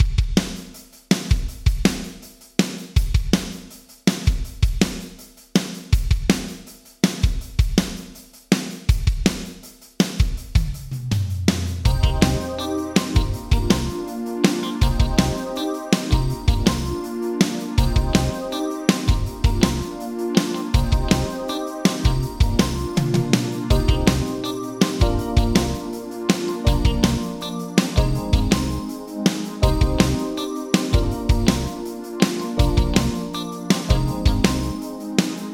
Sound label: Drums Yamaha ProR3 Tile Room; Vintage Digital